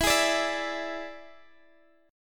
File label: E 7th E down Guitar Standard; strummed; capo 9